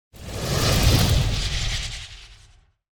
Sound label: Rock Throw Impact FLAME; Evolve; Meteor Goliath